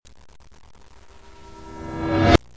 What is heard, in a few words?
poof pop